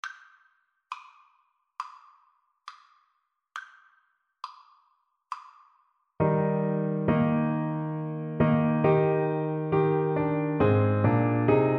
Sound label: Navy Hymn, Clarinet